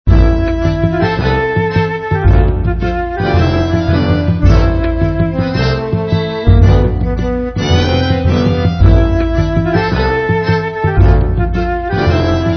Scene Transition, transitions

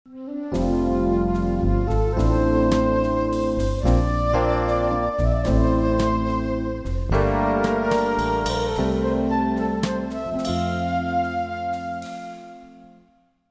Transition

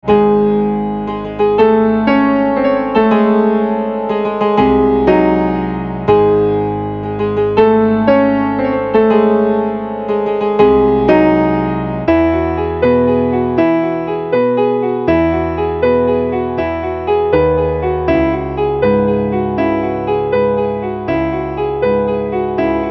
Shivering Fields

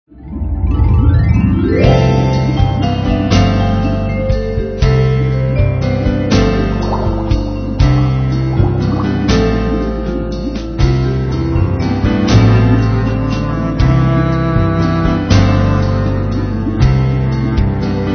Corsair Happy, transitions

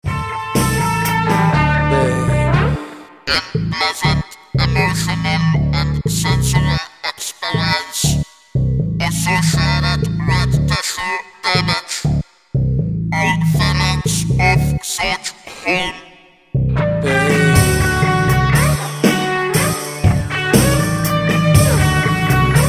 Pain

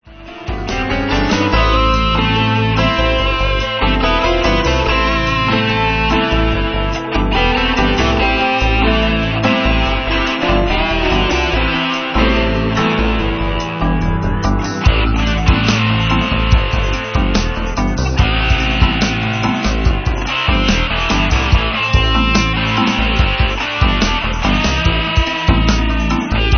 Woods; LoFi

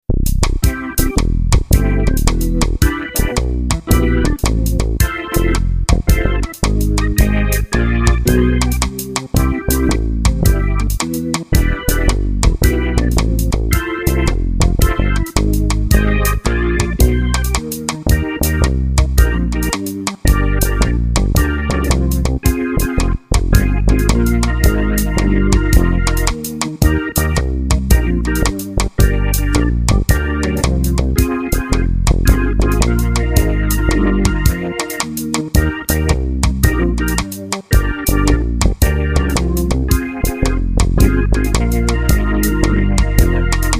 Ease Up

